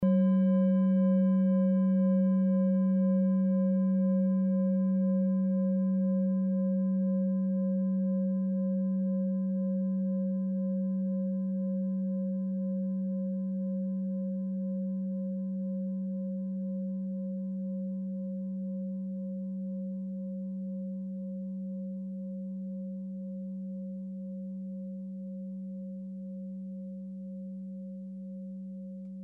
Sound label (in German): klangschale ladakh; Klangschale Nr.6 Bengalen